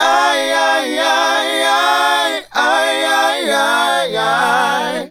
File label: Male Group, Vocal